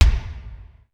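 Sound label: low kick; sr16 bd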